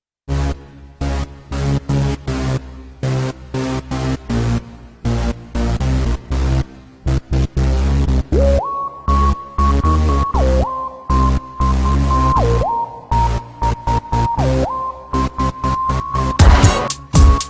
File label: dub hop, ringtone